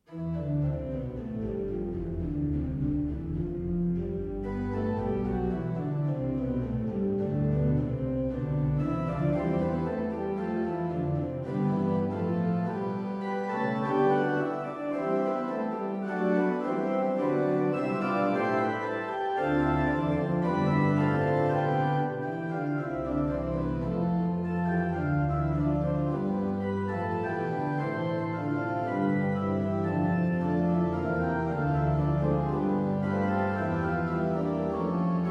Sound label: Fugue in C Major, organ